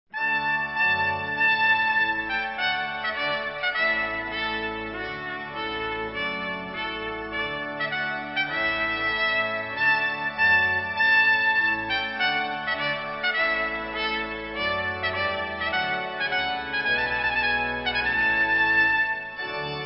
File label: Trumpet Tune